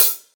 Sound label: raw hi hat closed sound D key; hits one shots; hat samples